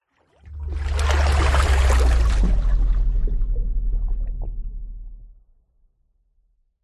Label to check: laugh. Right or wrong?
Wrong